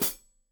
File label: Hat Foot, Hi-Hat, Cymbals Stereo